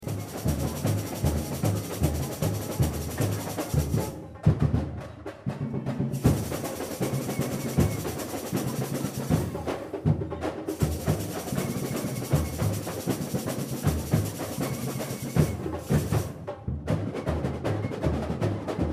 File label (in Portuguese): Break Ao Vivo; ZoumZoum; Portela; Rio